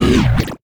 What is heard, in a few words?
Monster Hurt